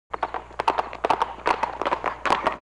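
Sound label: Horse gallop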